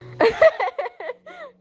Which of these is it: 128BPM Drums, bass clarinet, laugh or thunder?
laugh